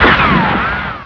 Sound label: bullet ricochet